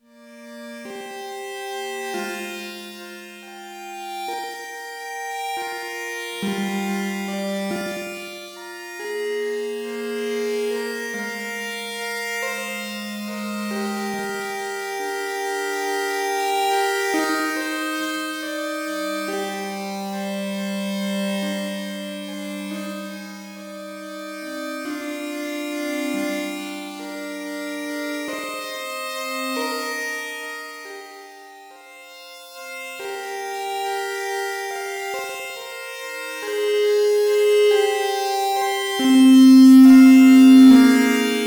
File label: Pad Randomized; Nunomo Qun Mk2